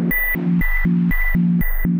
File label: Pan Rhythm; Inspired by Nature by Dillon Bastan; Tree Tone Presets